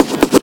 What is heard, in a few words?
Vinyl FX and Scratches, FX Scratch